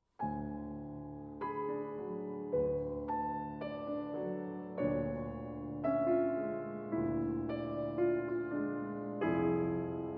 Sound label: Concert Studies in D Major, Andante - Vivace